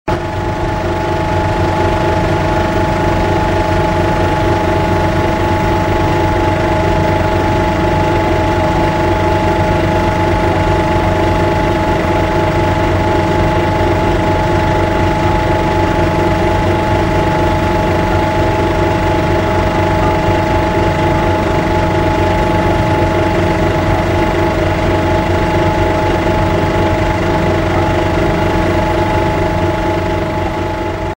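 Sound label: sounds of cinema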